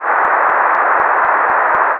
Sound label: broad sound